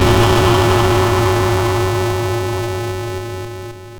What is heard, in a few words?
Gong, MOS6581R3